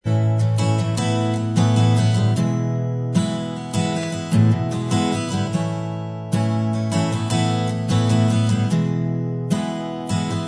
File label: acoustic, Inst